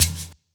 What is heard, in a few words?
Perc, Boom Bap Drum